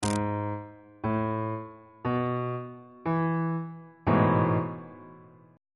a major 7th suspended 2nd 3rd inversion on bass clef